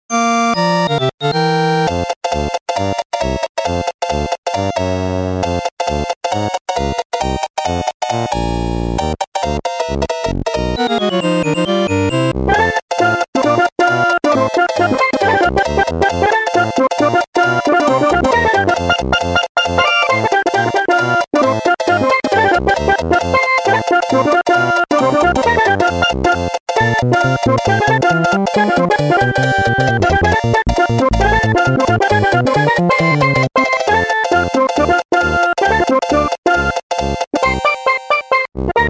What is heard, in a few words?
SNES soundfonts